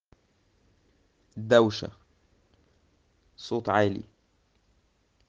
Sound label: traffic